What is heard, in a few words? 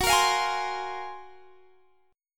F sharp Major Sharp 11th F sharp; F#M#11 Chord; strummed